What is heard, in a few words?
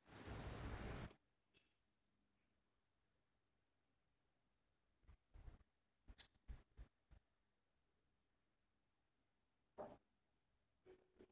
karaoke recording